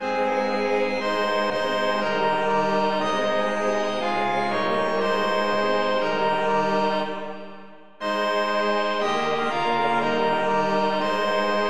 polyinstru; A l Agneau revient toute gloire; hymns

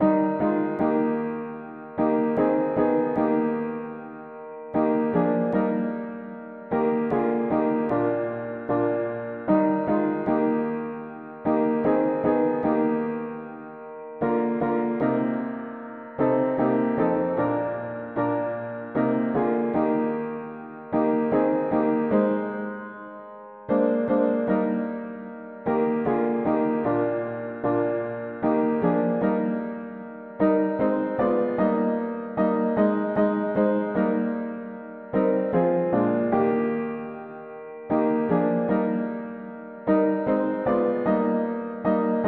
mono piano; hymns

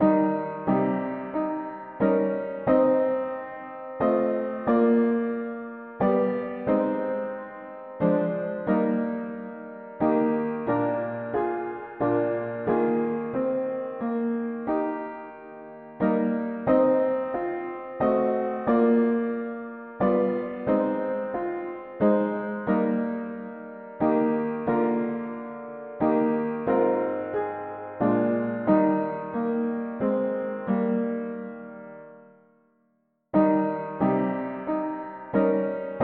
mono piano, hymns; Of all the gifts Thy love bestows

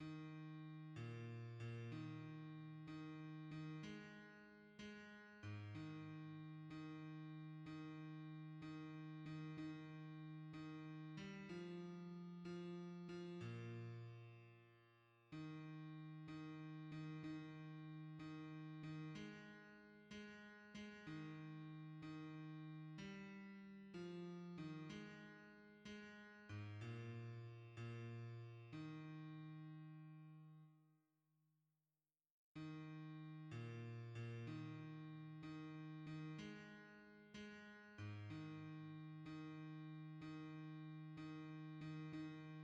bass; C est notre Dieu; hymns

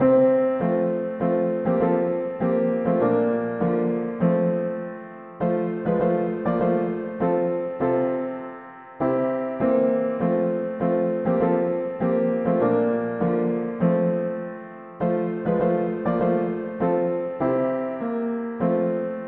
mono piano; hymns

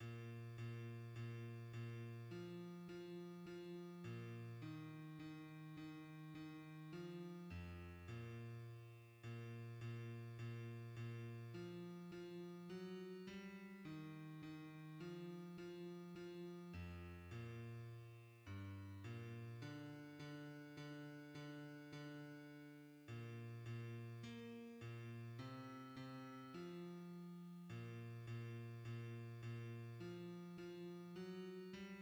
bass; Gloire a Toi qui pour servir; hymns

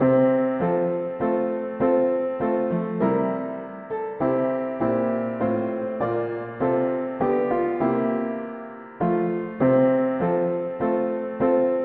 mono piano, hymns; Thou God of grace our Father

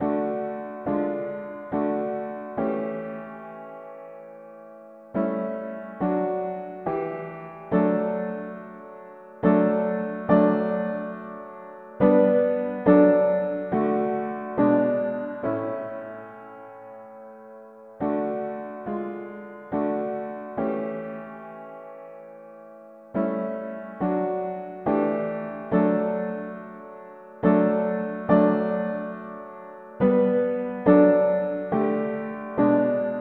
mono piano, hymns; Venez a Moi

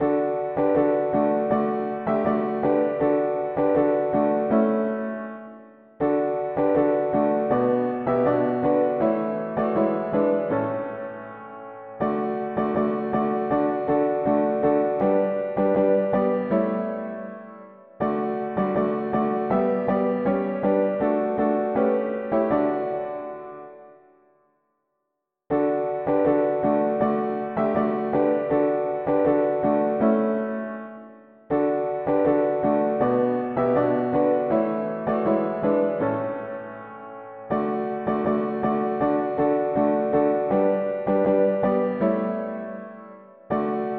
mono piano, hymns; Saviour unto Thee assembling

